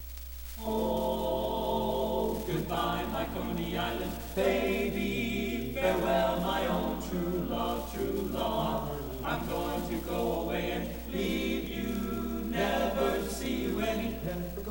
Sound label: High School Chorus; Terry Parker High School Chorus